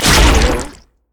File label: passive chunk crush; LoL; zac base